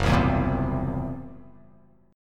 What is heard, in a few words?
F sharp 7th Flat 5th, F#7b5 chord; strummed; JGuitar